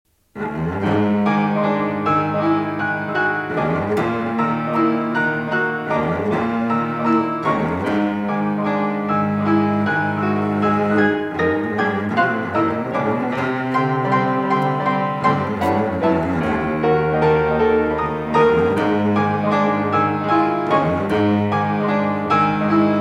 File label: Cello Sonata in C Major, Marcia; Britten; Op. 65 IV.